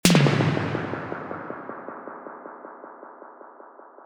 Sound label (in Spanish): Echo reverb y modulacion